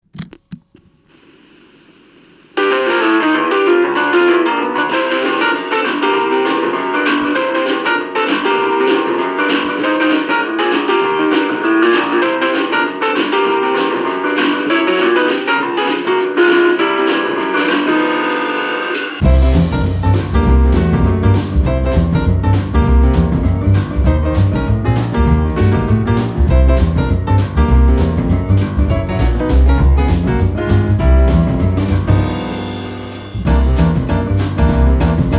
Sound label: blues boogie; trionyx; tacktime